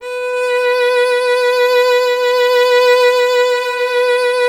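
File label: string violin, Solo Instruments; Miroslav Vitous - Solo Instruments VOL-2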